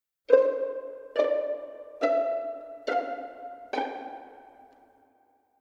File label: Strings Pizz